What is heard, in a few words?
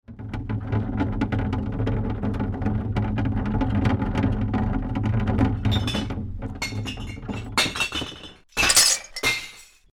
wheelie bin